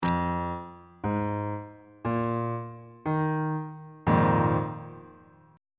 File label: a sharp dominant 7th suspended 4th 2nd inversion on bass clef